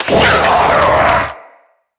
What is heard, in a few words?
Spider Vocal Attack Short; Vocals; Root Spider Mother